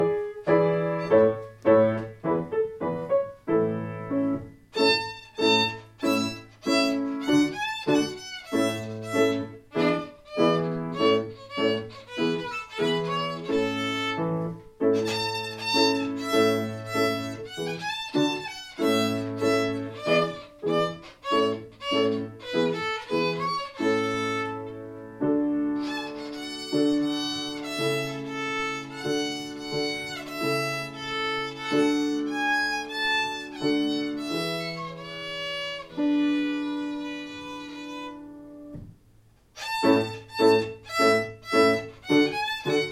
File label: violin allegro; Shinichi Suzuki; recital on April 5, 2014 in Marlborough, MA